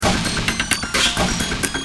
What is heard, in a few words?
Sick kit, Drums Perc; Industrial